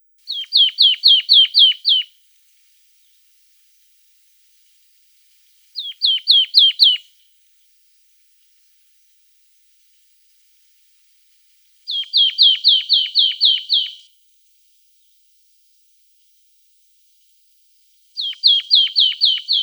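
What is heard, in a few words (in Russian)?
Gaichka chernogolovaja, marsh tit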